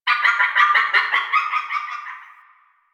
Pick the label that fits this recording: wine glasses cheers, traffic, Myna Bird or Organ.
Myna Bird